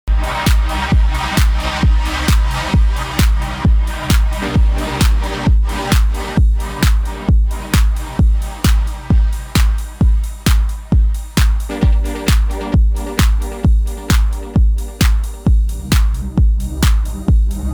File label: TR8 MX1 Pad Sidechain